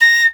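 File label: piccolo staccato A#5; Flutes; DirectWave; Varazdin Orchestral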